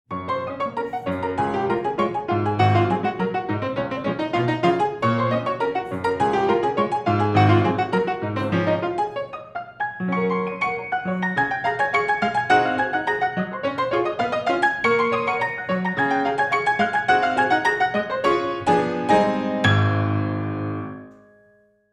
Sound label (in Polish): Fortepian